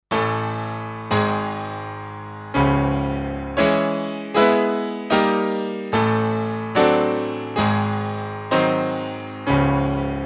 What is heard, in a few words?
Roland S-330 Forte Piano